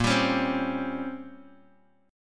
A sharp Minor 6th Add 9th, Bass Standard; strummed; 18,22,23,17; JGuitar

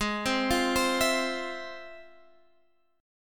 F Minor Major 7th, chord

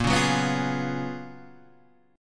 Gb Major 11th

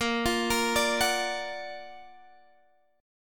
Gb Augmented Major 7th Bb

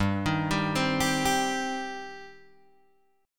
G Suspended 4th Sharp 5th G, down, Guitar, Open G

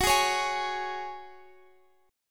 Gb Suspended 2nd; strummed

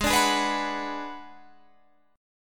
G sharp Minor 7th Flat 5th G sharp

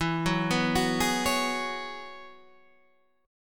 F sharp 9th Suspended 4th E, chord

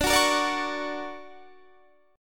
D Augmented Major 7th down Guitar Standard; D+M7 strummed; JGuitar

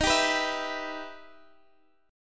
E Minor Major 7th D sharp

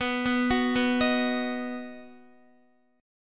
B-Major B down Guitar; B arpeggiated; 4F,4A,5C,5G,5C,6E; chord shape; JGuitar

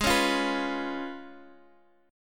C sharp 7th G sharp

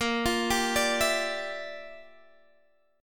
G Minor 13th A sharp

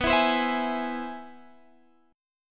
C Augmented Major 7th B

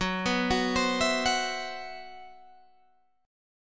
C Major 7th Flat 5th Gb, chord

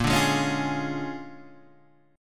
Bb 7th Sharp 9th Bb down Guitar DADGAD; Bb7#9 strummed; JGuitar

